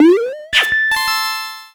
Character Attack

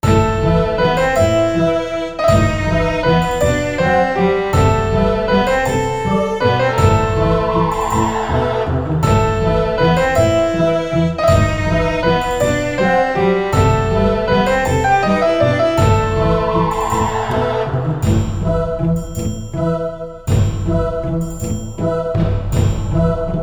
Halloween Forest; Secrets of Grindea; Eastern Region Music

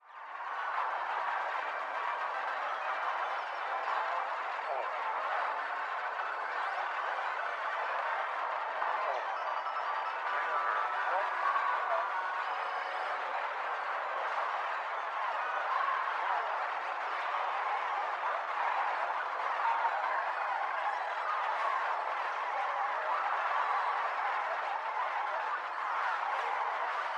Arcade Ambience; no delivery

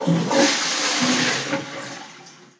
Toilet flush

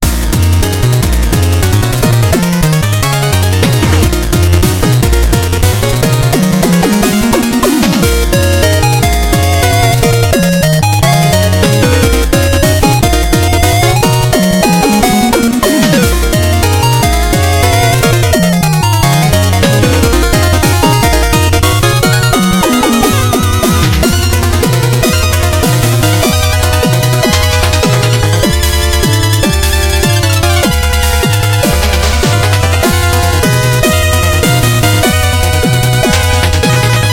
Music Minigame

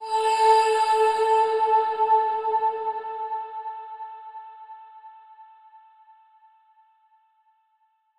Chime; chime sharp

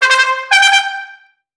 Trumpet; Chained Echoes

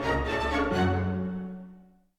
Danger End 64bpm; asterix obelix slap them all 2; Music Dialogue

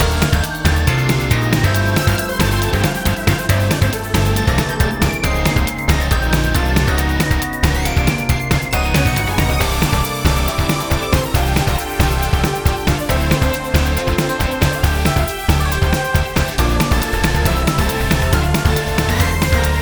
Music Race Loop

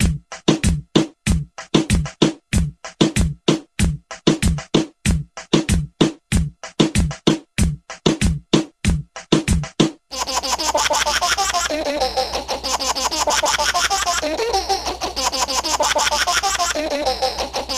baby laughs; timmy turners gadget room 2020

